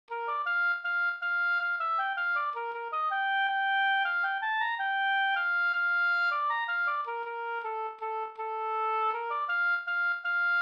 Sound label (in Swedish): vals; KJ Kjellman; Skoldinge